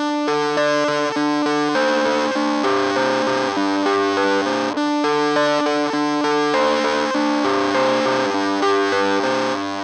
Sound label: mode 2 arp clip, fuzz